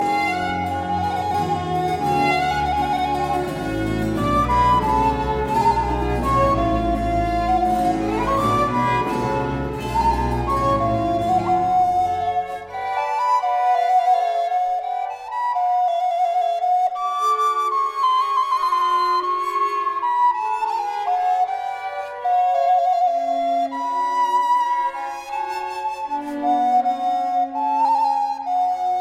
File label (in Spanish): Clasica